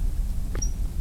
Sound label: warbler pale legged leaf Phylloscopus tenellipes